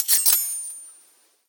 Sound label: ping